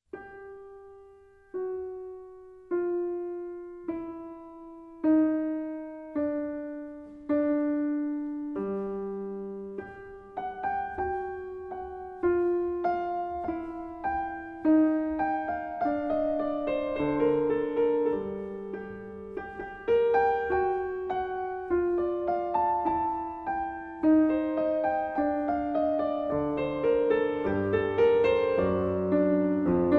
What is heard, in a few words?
Chaconne